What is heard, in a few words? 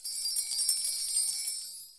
Bells, Latin Percussion; Drum Hits; Ableton; Factory Packs